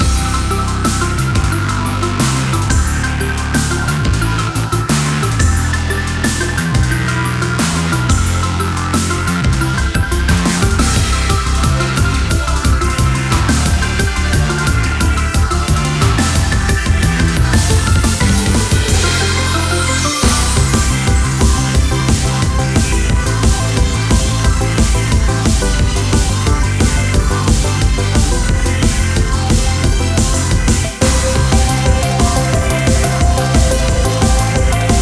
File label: bgm battle